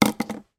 household bath sprinkles small bottle down on table